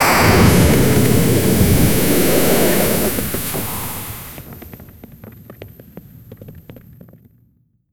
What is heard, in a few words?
pixel game the explosion